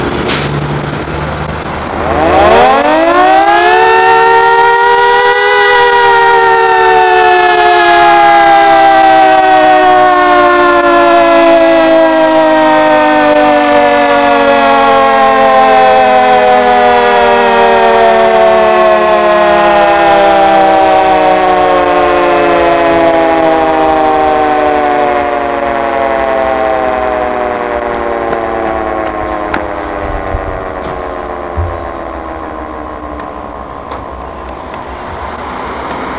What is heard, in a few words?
siren